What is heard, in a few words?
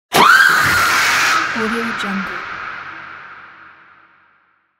horror scream